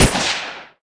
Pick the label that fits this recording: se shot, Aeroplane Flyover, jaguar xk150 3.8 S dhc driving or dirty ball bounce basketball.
se shot